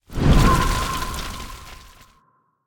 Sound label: phoenix rebirth flap; smite; npc